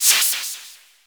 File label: Pickup Magic; SFX Kit; Pickups